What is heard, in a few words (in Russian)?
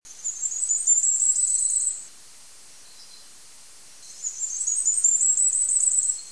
krasnogolovyy korolek golos